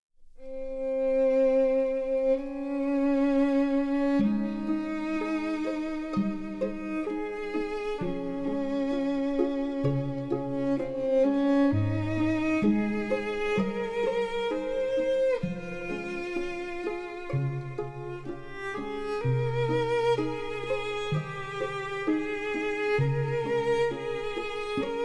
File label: Epilogue instrumental; Ah My Goddess